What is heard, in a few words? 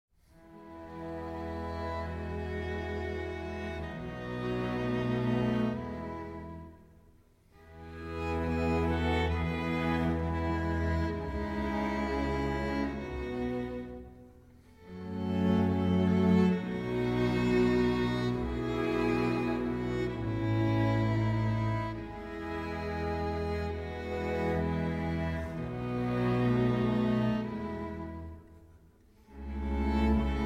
string quintet, chamber music; autumn strings